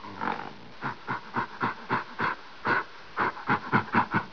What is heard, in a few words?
primate, ambient